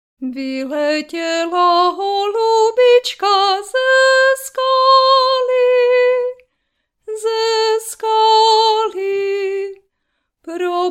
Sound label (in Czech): zensky Zpev muzsky Zpev